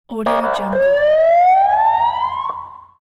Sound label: street police siren